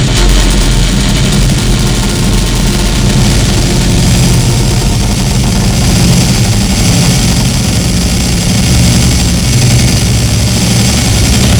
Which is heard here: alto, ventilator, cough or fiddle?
ventilator